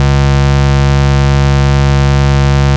Ultimate Bass Root C#; Vengeance Samples

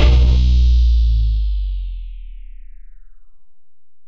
VFX2 Sub

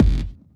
kick, DnB Breakz Kit; Breaks N Beats Sound Kit

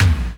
Tom, Percussion; Vengeance Samples

